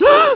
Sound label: woman gasp